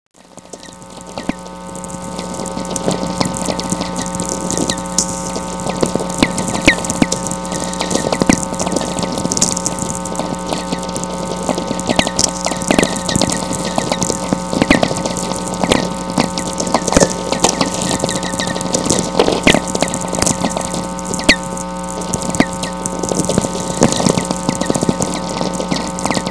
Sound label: tweeks; Natural VLF Radio Emissions